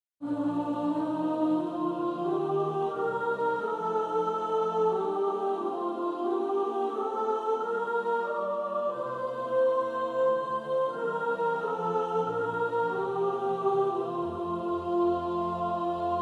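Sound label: When morning gilds the skies soprano, choir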